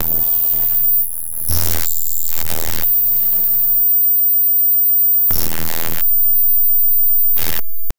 a glitch sound

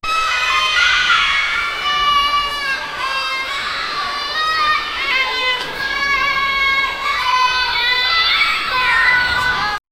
children strings

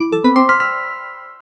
application

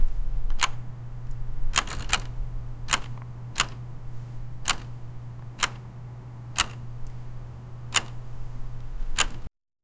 a bunch of cameras taking

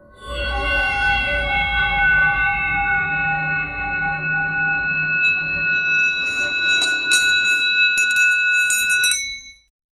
futuristic

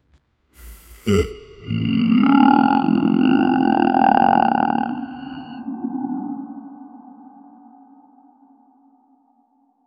mixture of long farts

